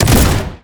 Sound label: shotgun